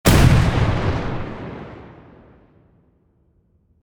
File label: Pirate ship cannon single fire